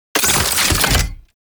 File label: Scan Pillar Plant Close; DE; Warframe; Frumentarius; Scan Ability